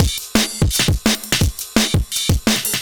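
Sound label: Drum and Bass Beat 170, drumnbass 170bpm; musicradar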